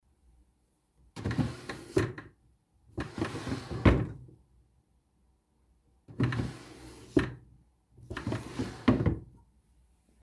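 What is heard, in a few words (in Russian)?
лёгкий в изящном итальянском шкафу метровой ширины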